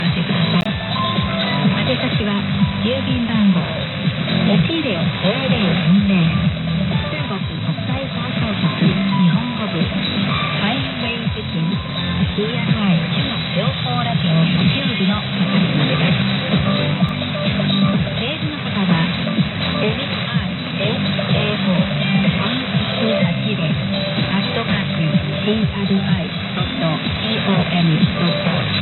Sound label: 7mhz